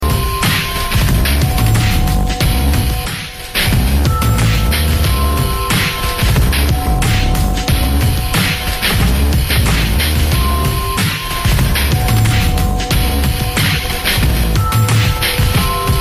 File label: Electro Fusion